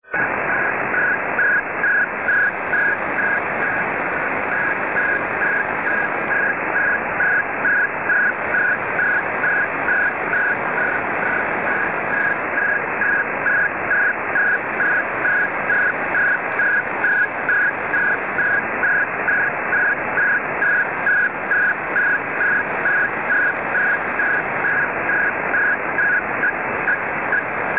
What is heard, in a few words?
fsk; Global Link Network Station Free Signal